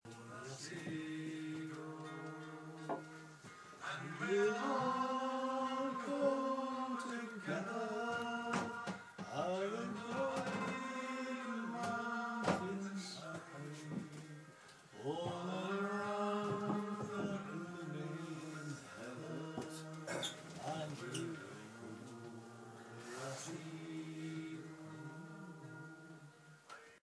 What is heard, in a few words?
sing song